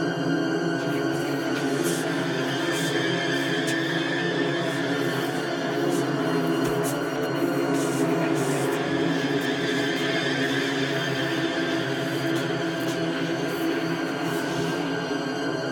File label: Timed attack Loop